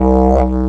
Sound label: Didgeridoo; Jimmys; qubodup; pitchglitch